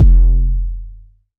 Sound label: BD, Waldorf Blofeld